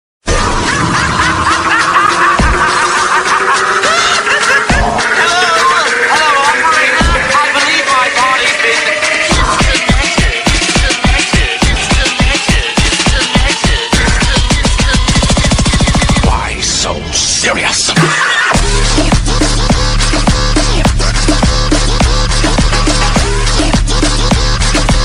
Song Remix Ringtone

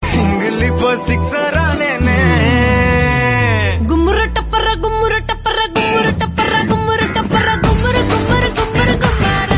Female Tamil Ringtone

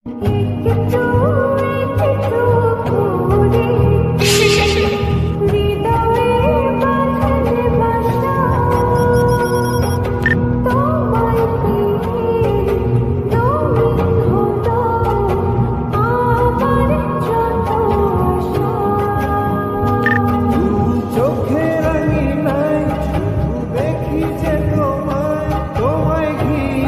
Bengali love Ringtone

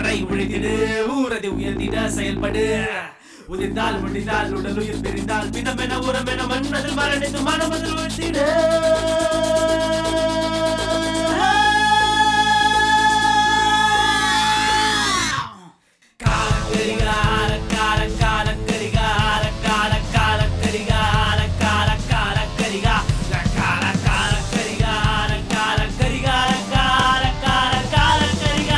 Tamil Movie Intro Song; ringtones; Kaala

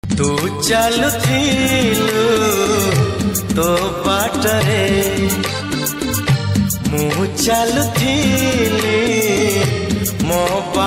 Song, Odia Ringtone

